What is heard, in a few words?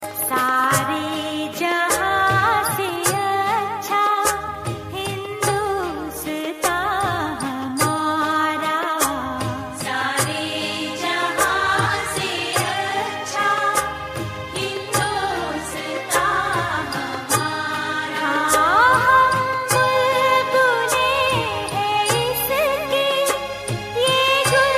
country india